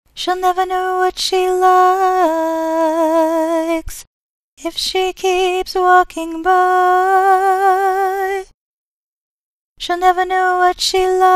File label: Vocal